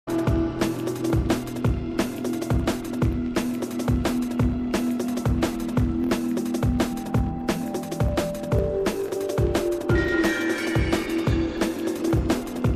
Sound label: Drum and Bass Jungle and Old Skool, UK Bass Radio